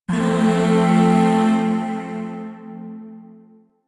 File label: Zariman Void Shawzin Maj Chord